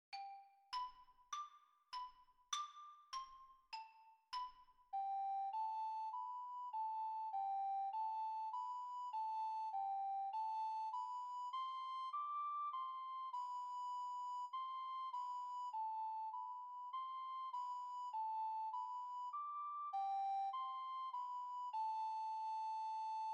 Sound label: The Big Drum; Recorder